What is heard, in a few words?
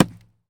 Baseball Hit Wall